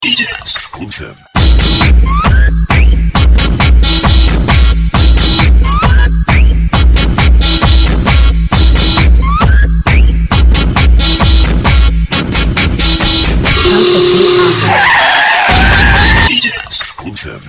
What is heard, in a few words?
Budots Honk